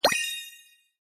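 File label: ball startup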